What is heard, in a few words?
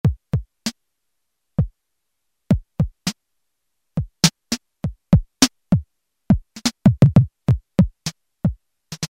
Boom Boom Chik